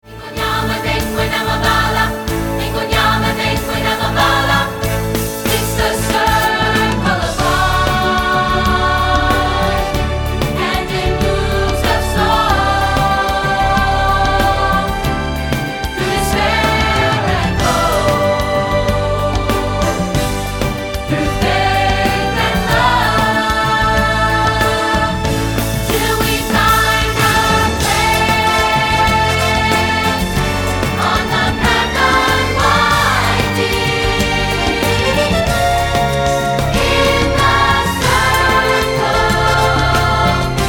Lioness Grassland Jungle Vocals

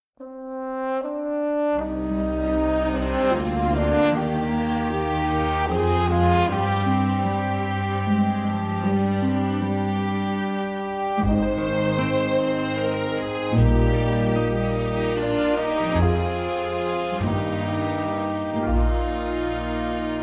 Accompaniment

